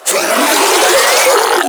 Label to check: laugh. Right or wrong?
Wrong